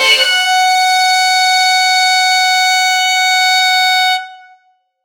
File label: violin